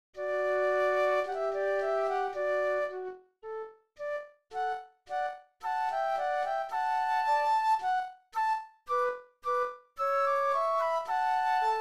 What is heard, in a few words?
Flute Duet; classical